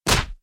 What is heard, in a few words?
hit; Clicker Heroes